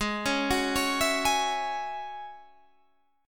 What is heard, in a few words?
A flat 7th Suspended 4th Sharp 5th A flat Guitar Standard, chord; Ab7sus4#5; 16,16,16,18,17,16; arpeggiated; chordshape; JGuitar